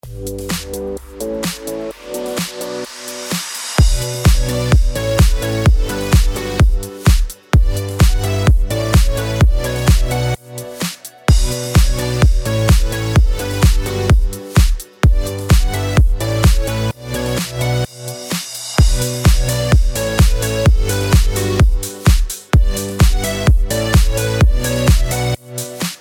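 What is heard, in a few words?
BED ELECTRO HOUSE B minor 128BPM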